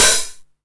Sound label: 909 OHH Tape Sat; Goldbaby Tape808 & Tape909; The Tape 909